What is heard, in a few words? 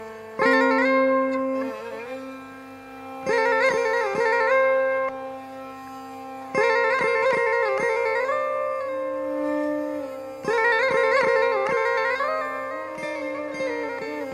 Hemavati initial rhyme, ālāpana